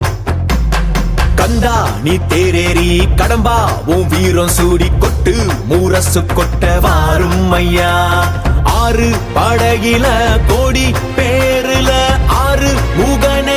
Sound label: tamil murugan song; murugan bgm ringtone; murugan vazhthu